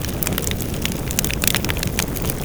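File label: hoop sound; WhiteOut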